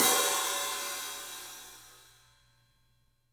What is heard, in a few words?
SABIAN HAT; 3D Sound; 3D Drums